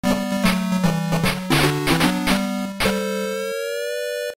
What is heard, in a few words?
gameover